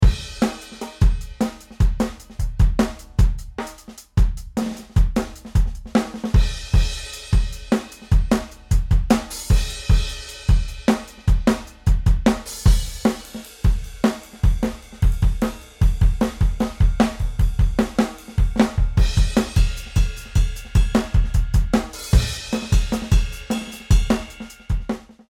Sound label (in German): Schlagzeug unbearbeitet; Addictive Drums